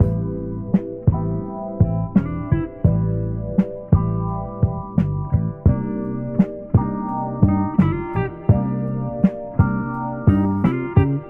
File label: keys, Phonky; Loops